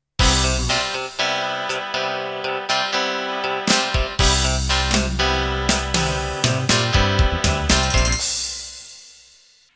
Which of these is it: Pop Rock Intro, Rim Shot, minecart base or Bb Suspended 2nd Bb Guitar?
Pop Rock Intro